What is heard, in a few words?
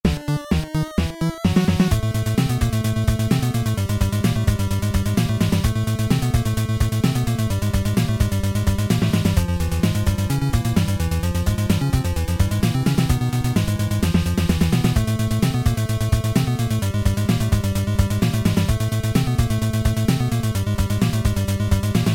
Boss Battle